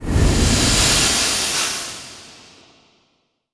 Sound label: Seal, Plasma; SpecialFX; NanoFXv2